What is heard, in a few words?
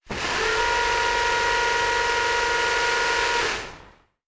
servo big, sci-fi sounds, mechanical